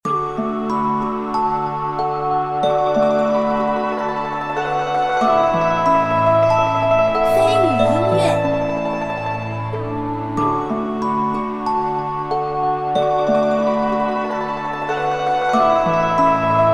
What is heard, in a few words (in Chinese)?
山谷-灵动-空旷